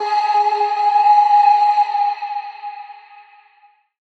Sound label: tonal oneshot Ab; Pop; Slate; PASADENA Pop Sample Pack; Tonal One Shots